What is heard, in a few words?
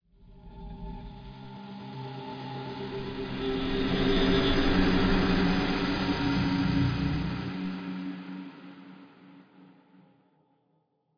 Night Music; Undead Overlord